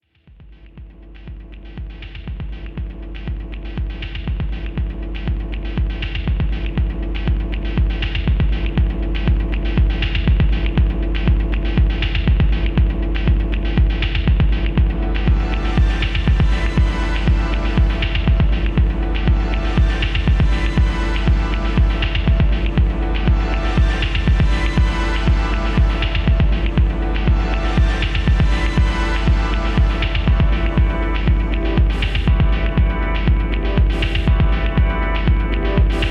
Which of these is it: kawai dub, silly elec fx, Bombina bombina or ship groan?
kawai dub